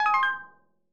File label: order confirmed